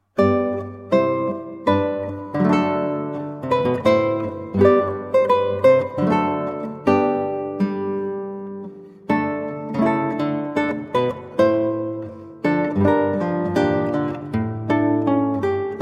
Galliard, 8 String Guitar; Queen Elizabeth's Galliard; Dowland; John Dowland

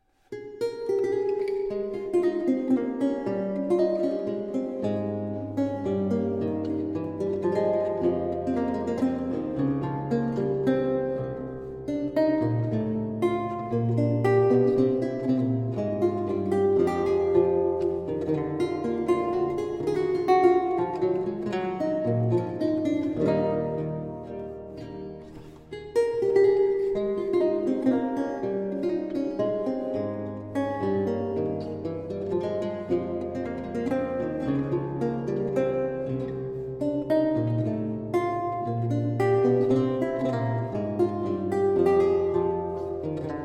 Courante, Lute suites; La belle Angelique; Charles Mouton

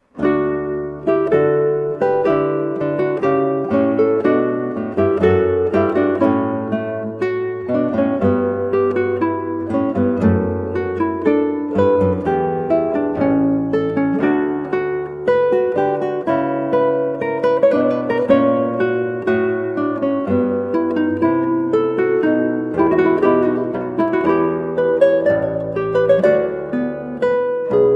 Adagio, Guitar; Trio Sonata in D Major HWV 397; Handel; George Frideric Handel

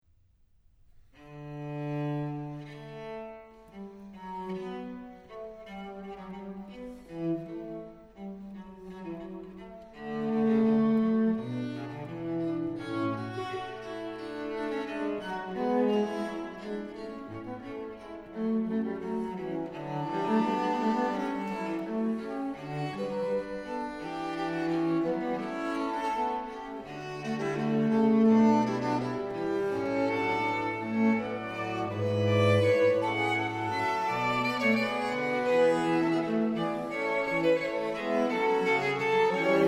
Fugue arranged for string quartet in E Flat Major; Fugue from WTC II; WA Mozart; K405 No2; BWV876

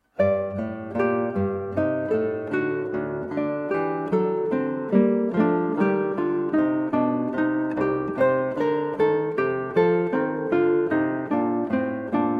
Andante from Trio Sonata, Guitar; Georg Philip Telemann

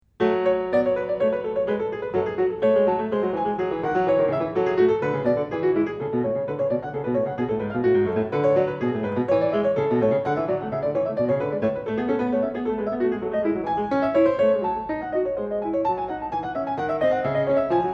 Prelude and Fugue No 14 in F-sharp minor, Clavier; Johann Sebastian Bach; Well-Tempered Clavier

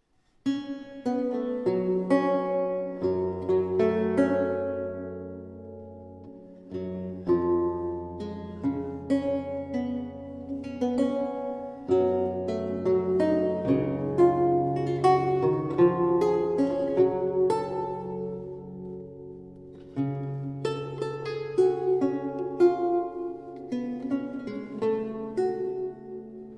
Prelude, Lute suites; Charles Mouton

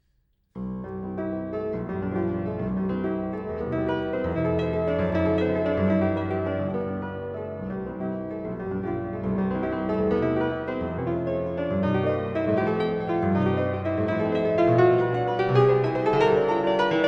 Preludes Op 28 - No 1 in C major, Piano; Chopin Preludes; Frederic Chopin

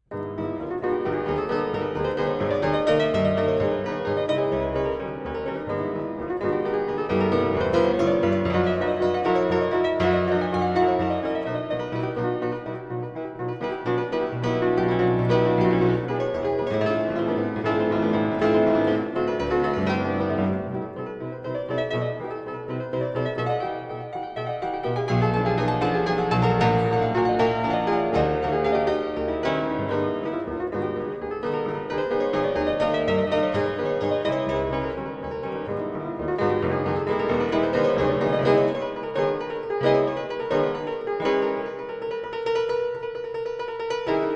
Preludes Op 28 - No 12 in g sharp minor, Piano; Frederic Chopin

